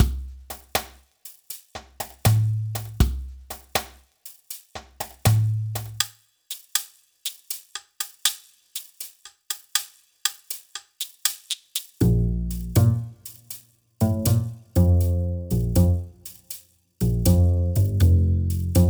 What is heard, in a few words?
Hula (No Ambience); wii fit u